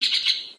new birds, ambient outdoor

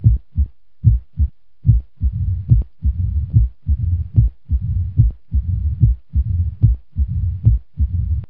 heart; ambient

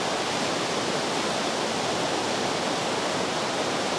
swamp wind; ambient; outdoors

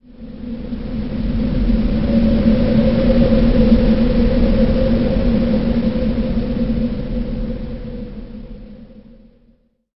dark wind; ambient; outdoor

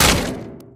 impact weapon large metal; collide; large-weapon

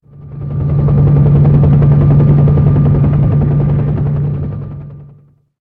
helicopter, outdoor ambient

